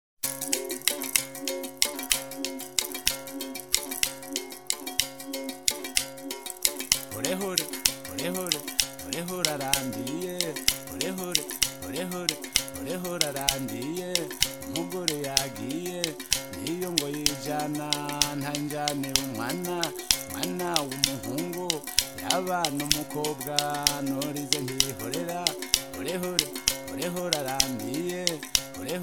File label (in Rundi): idono, burundi